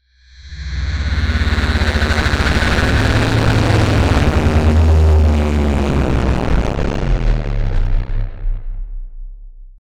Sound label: 2jz exhaust sound; motorsport